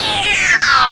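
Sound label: guitar, 145 BPM, drum & bass; Ueberschall; Drum & Bass Flash Trackz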